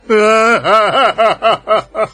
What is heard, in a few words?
hua hahahahaww